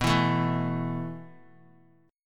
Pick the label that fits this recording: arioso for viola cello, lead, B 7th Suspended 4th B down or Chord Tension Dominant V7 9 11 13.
B 7th Suspended 4th B down